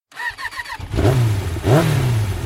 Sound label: motorcycle start and revs